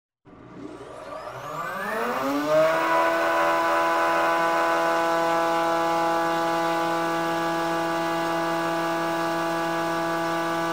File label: traffic